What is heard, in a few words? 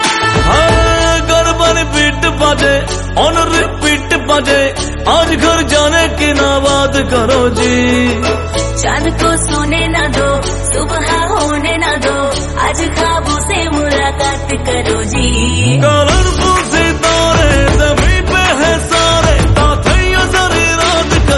garba beat